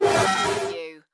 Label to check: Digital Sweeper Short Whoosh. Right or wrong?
Right